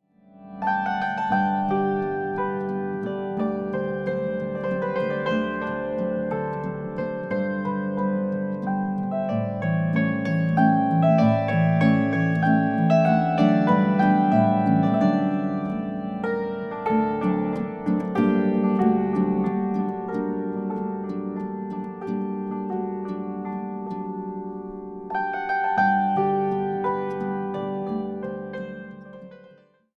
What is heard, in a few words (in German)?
Menuett; Harfe & Hackbrett